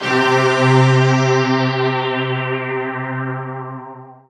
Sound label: SYN K-2000, Roland